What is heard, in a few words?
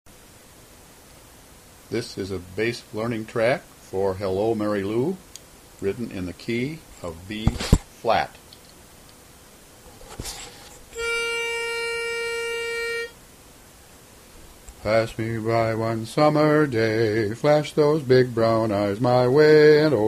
Bass, Learning Tracks